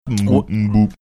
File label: making what thinks is a cappella sounds